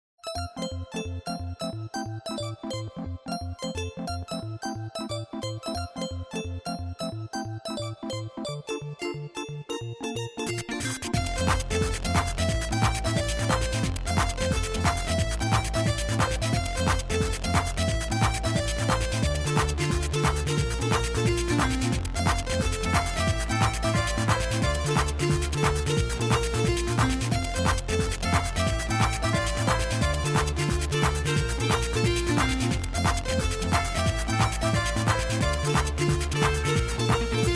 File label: Drive Music; tml